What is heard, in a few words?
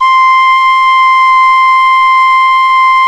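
PENNY WHISTLE; Exotic Winds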